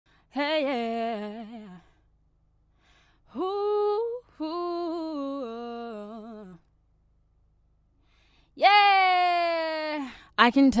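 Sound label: pop, 61, F major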